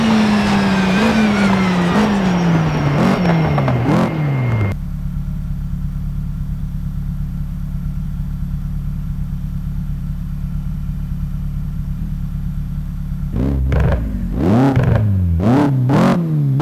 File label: slowing, vehicles; sgmcars